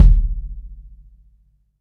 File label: kicks